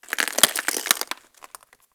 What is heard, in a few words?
Ocean Glass Crack Small; Warframe; Grineer Ocean